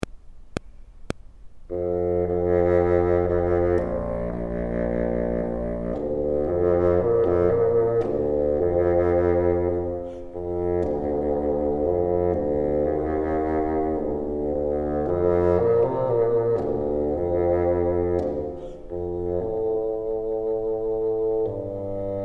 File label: bottom, bassoon